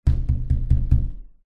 household window person knocking fist thud on window; zapsplat